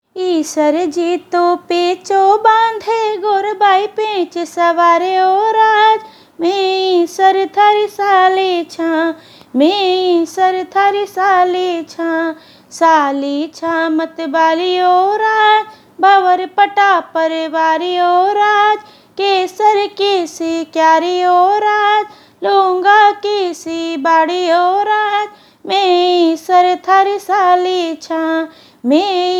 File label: Isarji to pecho baandh, gangor geet, marwari; सीठना; गनगोर गीत